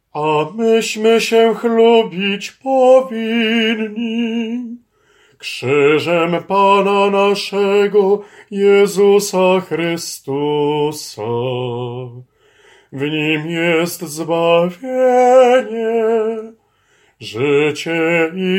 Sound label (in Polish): SOPRAN; A mysmy sie chlubic